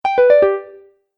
Game Bonus Descending Strum, Video Game Sound Effects